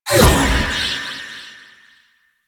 Wraith Throw; Enemies; Warframe; Zariman

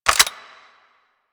Harpoon Gun Reload; Warframe; Sharkwing; Grineer

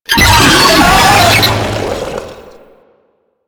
Brachiolyst Attack, Vocals; Enemies; Warframe; Proto Infested

